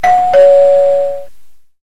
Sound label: Doorbell; ZTE Z992 Avail 2